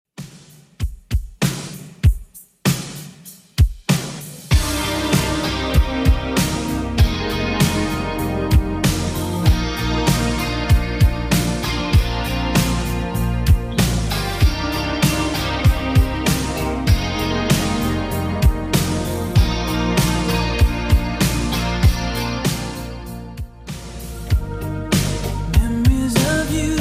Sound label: 80s Redrum 97bpm